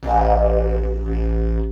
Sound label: Didgeridoo Kit Samples